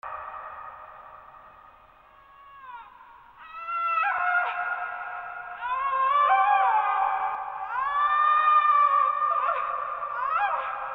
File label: dark tortured screams female voice